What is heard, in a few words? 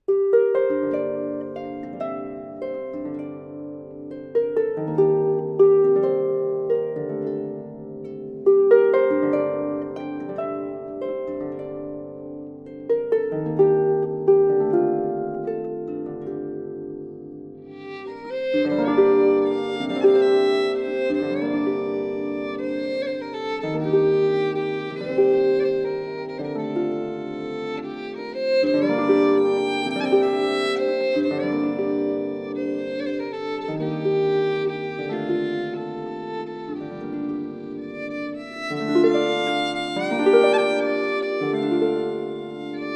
Celtic music Harp and violin; Broceliande; La Complainte de la Blanche Biche